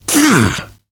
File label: hurt minor, survivor voice, biker